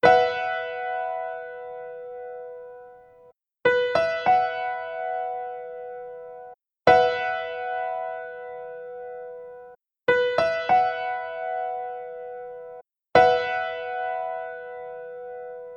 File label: Bsus4 Piano Chord